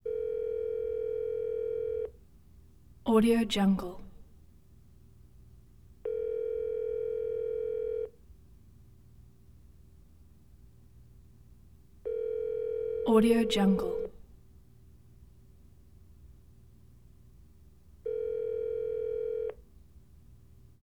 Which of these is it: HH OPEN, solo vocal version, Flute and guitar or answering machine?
answering machine